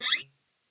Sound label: scrape, glowly bass